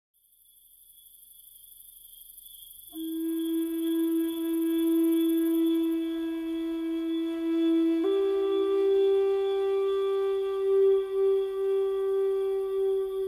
Sleep Therapy Flute & Crickets

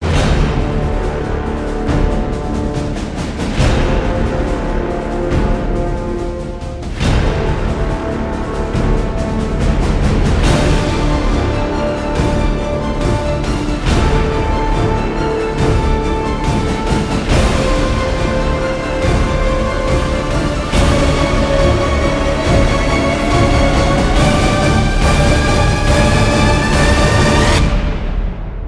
No Choir

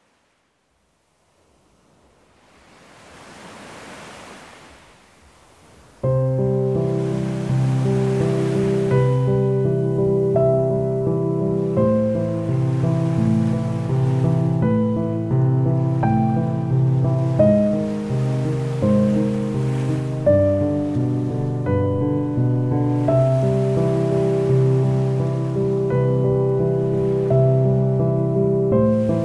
Seaside Waves, Piano in Nature

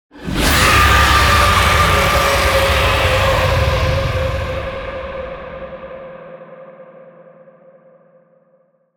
Kaiju Scream; Ninja Tracks

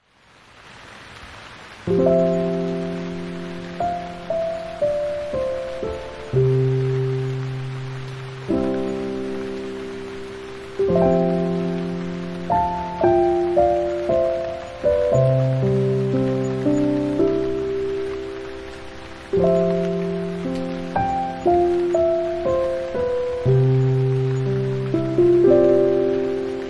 Sleeping Rain Song, Piano & Rain

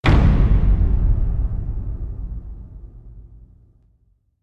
Hit Big Kong Lives; Brand X Music; Brand X Music Catalogue - Editor's Essentials

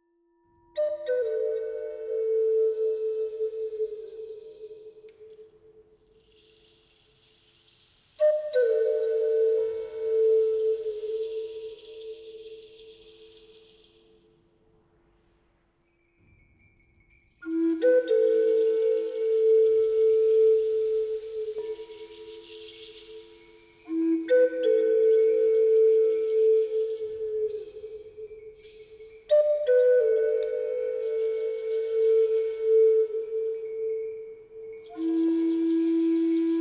Light Thunder Ushers in the Evening

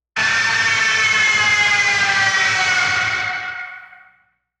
Burnout Scream; Ninja Tracks

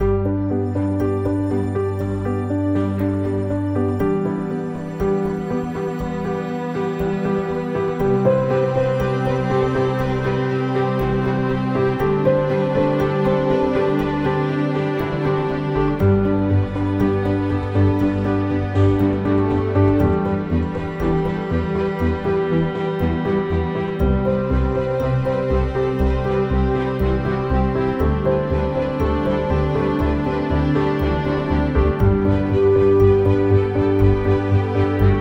Ambient

